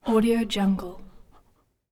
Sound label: male breathing various irregular breathes; human sounds